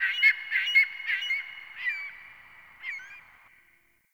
Beach Bird Distant; Midrath Beach

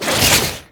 Transform Claws Whoosh; Warframe; Weapons

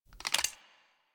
Double Barrel Shotgun Foley; Weapons

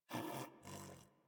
Pet Snore; Enemies; Warframe; Catbrow; PetShip

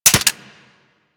Lever Action Rifle Mech, Weapons; Warframe; Tenno

